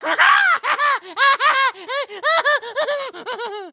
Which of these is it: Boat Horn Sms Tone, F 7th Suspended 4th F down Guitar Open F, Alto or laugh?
laugh